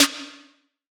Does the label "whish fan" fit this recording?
No